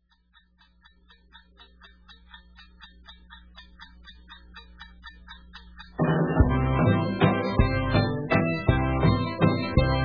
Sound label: waltz